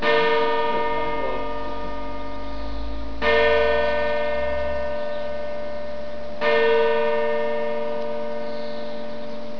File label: bike; france